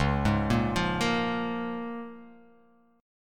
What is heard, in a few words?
C sharp 6th C sharp down